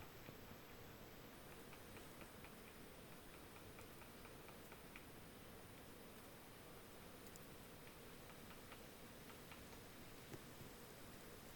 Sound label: piculet drumming